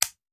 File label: Machine Gun Foley; Sound Ideas; Guns